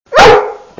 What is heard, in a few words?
dog ruff